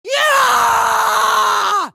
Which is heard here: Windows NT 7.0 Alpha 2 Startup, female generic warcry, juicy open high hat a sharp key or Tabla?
female generic warcry